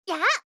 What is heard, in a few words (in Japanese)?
攻撃